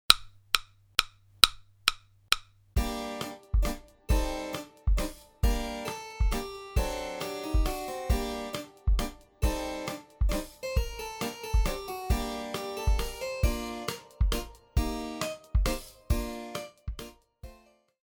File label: Triple Meter Medium; My First Rhythm Cup Explorations